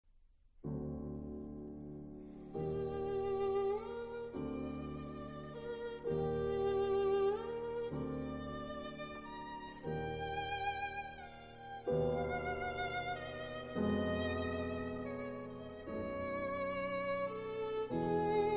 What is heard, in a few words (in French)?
Nocturne violon piano